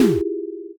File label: shock don